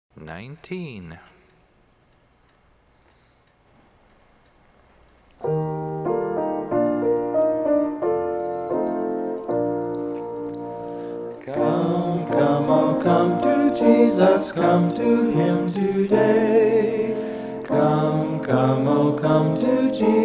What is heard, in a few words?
Hymn